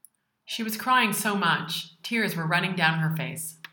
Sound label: run crying